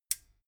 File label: metal button stereo click power off